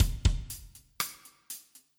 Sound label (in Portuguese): RITMO